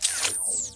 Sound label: phaser off; sona